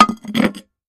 Plate Glass Setting; eating

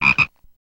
Frog Chirp Single

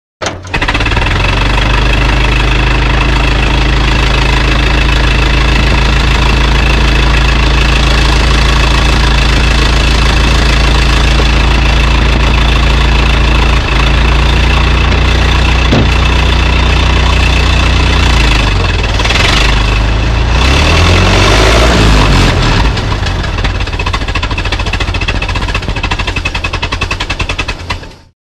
Start Idle Stall Mode, ford model t

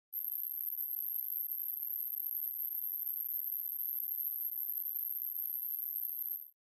Noise HF Elect Drone

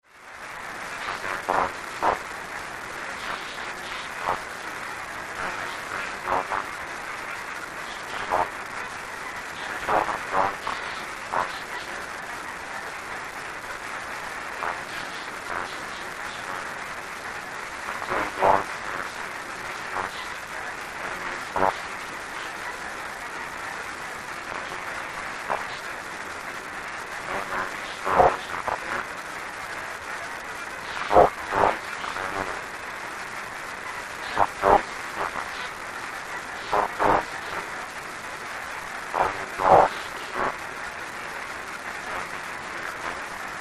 Communications Static, static voices; Communications Static Faint Voice

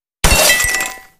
Glass Impacts Debris

